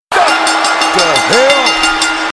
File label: wwe bell